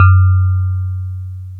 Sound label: DX PIANO